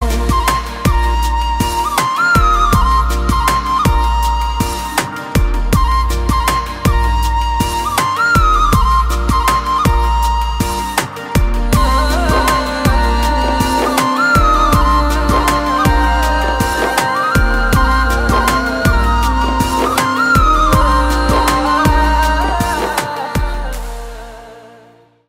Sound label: instrumental ringtone